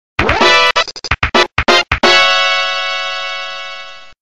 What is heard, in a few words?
Race Start; Banjo-Pilot